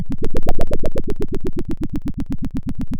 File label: Sound of a dinosaur